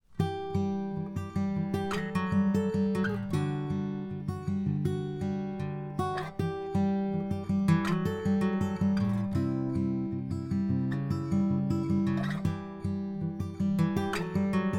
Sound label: Fingerstrum A, Samar MG33; MG33 DPA; guitar; Hayden Cluff on guitar